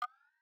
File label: checkpoint faster